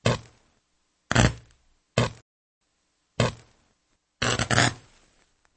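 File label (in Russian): треск электрических искр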